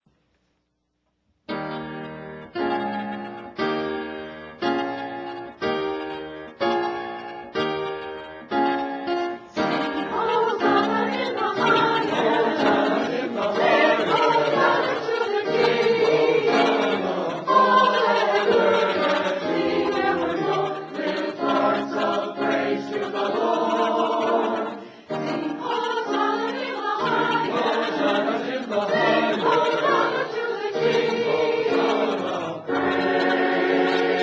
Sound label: Music Choir Sing Hosanna in the Highest